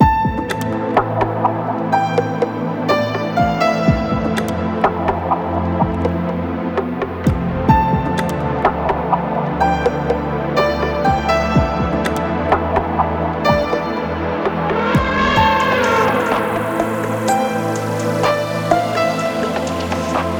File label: AMBIENT LOOP